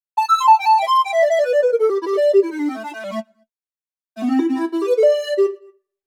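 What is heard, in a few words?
A Synth Flute Lead, 130 bpm; Zero-G; Future Wave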